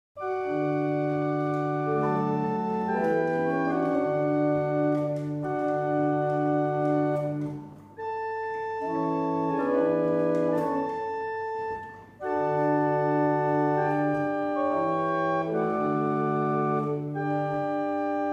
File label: Double chant, barrel organ; Winwick; Boyce